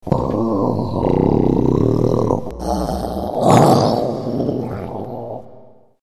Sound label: growl